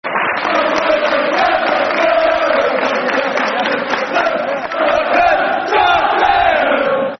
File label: Football Chants (Soccer Song); Tottenham; Tottenham Hotspur Thfc